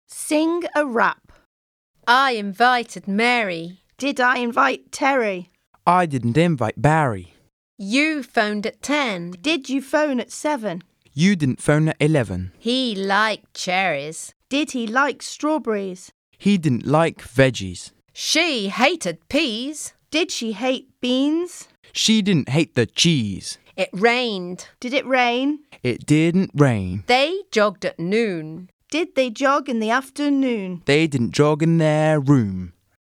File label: Rap song